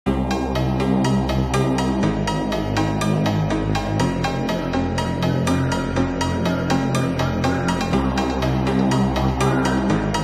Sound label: inspirational